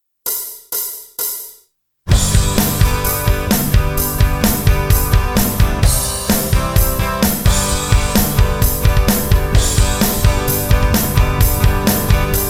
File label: music acoustic guitar; startup optical